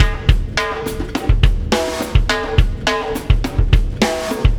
Wormhole Beat, Processed Drumkits

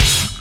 GTR; Roland - LA Composer vol. 2

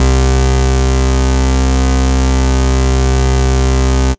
Bass Note C

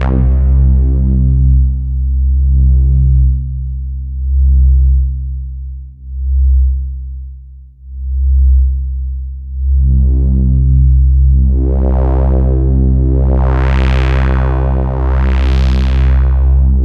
Roland JV 2080, interval; MG INTERVAL

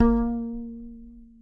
acoustic bass vib A#3; Masterbits CLIMAX 7 - Bass

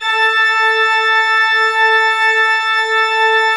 A3, Cathedral Organ; ILIO; Propeller Islands Cathedral Organ

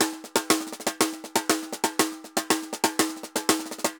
Tambor Samba 120 bpm; Best Service; Festa Latina VOL-1

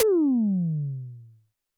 TD-20 Tom A, Roland TD-20 Toms

